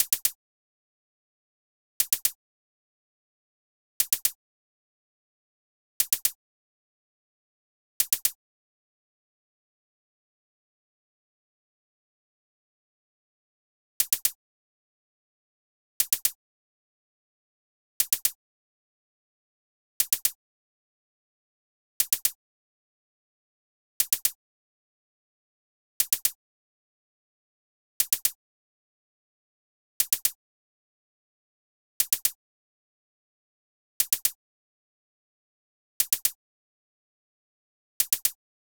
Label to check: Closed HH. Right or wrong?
Right